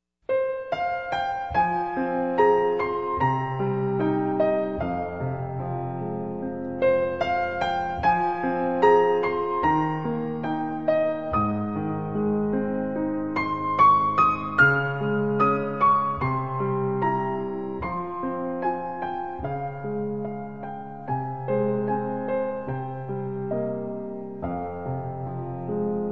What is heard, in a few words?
piano